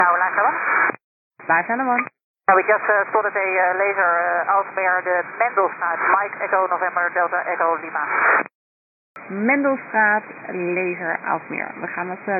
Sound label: heli